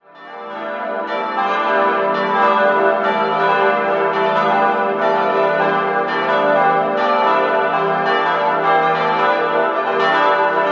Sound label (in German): Ingolstadt Christophorus, Glocken